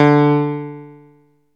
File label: PIANO D3; Best Service Alpha Dance vol.2